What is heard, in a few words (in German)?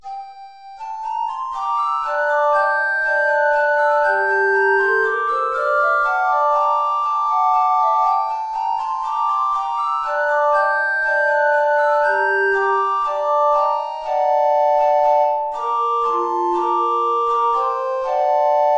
3 Blockflöten (SSA); Raggi dov' e'l mio bene; tiefe Notierung